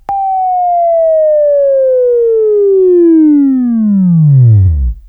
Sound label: Buzz, Left